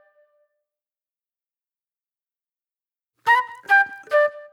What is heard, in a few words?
Sparse Flute; Sonic Foundry (Sony Creative Software); Manhattan Flutes; Garage Funk